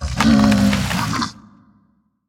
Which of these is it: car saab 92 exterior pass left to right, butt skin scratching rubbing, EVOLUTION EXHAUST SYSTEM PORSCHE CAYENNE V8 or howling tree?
howling tree